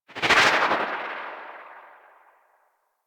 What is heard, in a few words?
Atmosphere Flyby Left, Vehicles Movement; Warframe; Archwing